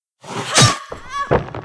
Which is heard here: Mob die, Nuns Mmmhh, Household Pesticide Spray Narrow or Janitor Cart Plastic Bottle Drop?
Mob die